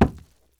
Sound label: bodyparts fall; Doom